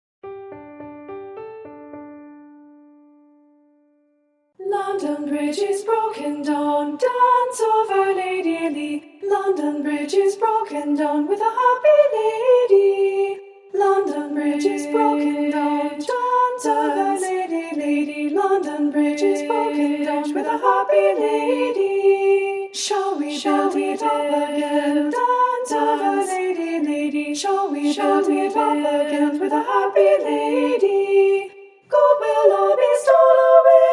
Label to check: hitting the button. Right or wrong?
Wrong